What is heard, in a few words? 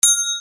BELLS